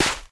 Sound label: step sand